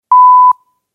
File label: BEEP; TOEFL iBT Prep Material; Longman TOEFL iBT